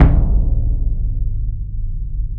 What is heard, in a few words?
Concert BD f; Digital Sound Factory Virtuoso